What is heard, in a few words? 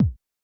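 bass drum Dance, Kick, Yamaha DTXpress-II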